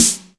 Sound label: DX MIX SD; REMIX 3